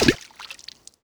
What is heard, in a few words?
water small